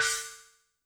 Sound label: Gong; YAMAHA RM1X